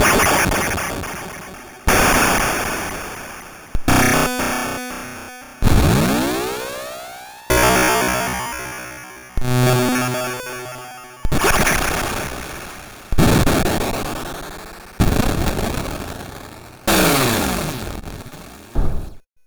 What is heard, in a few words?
Drums, Hits; DCM8